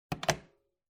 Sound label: Telephone Hardware Receiver Hang Up